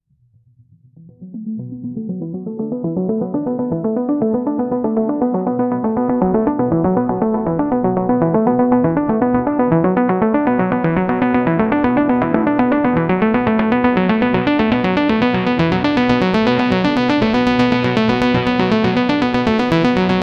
warm arpeggios on an analog synthesizer with a gradually rising filter cutoff and a reverb